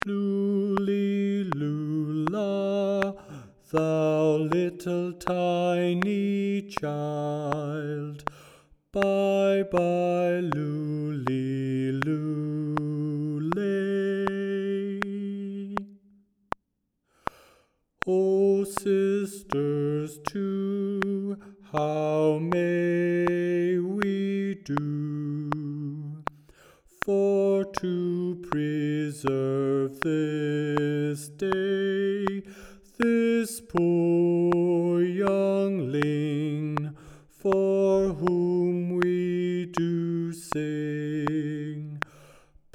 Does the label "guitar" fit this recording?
No